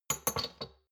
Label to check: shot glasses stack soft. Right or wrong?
Right